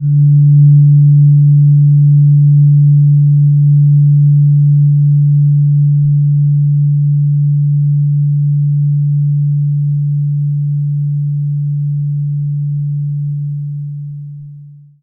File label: fork Mid; Mars